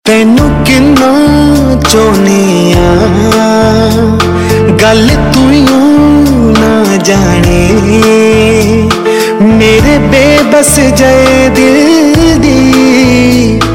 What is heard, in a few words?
Cover Ringtone, Punjabi